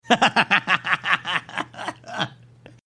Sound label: awesome laugh